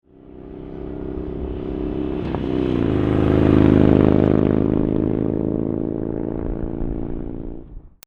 FLYBY

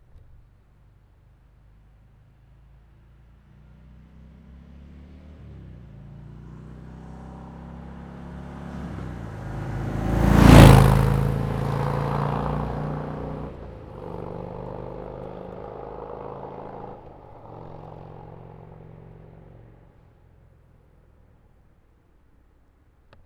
Fat Bob 107 Fly By

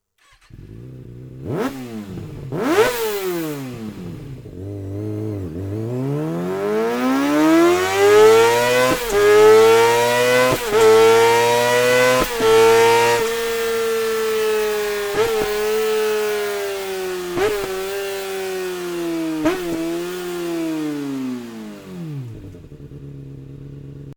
linkage pipe kawasaki zx6 r zx636; akrapovic

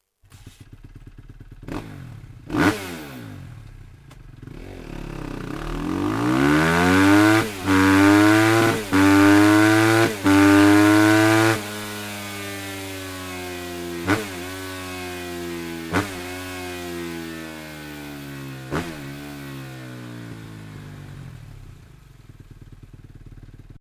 slip on exhaust kawasaki kx250 f; akrapovic

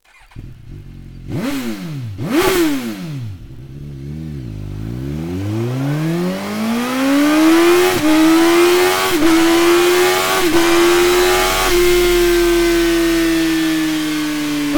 slip on exhaust suzuki gsxs1000 gsxs1000 f; akrapovic

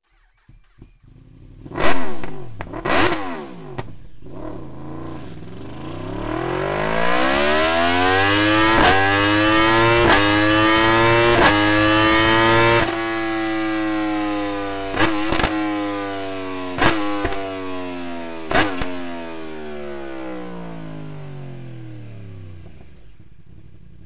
evolution gp exhaust system yamaha r1 r1 m; akrapovic

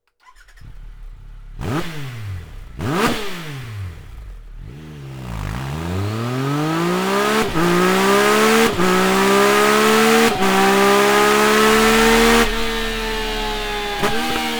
Tri Tiger 1200 stock exhaust

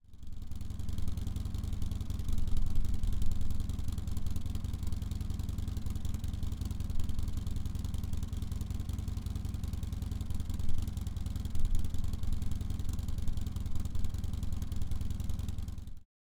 Touring 103 STOCK Idle

